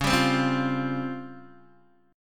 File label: Db Major 7th Flat 5th, Guitar Open D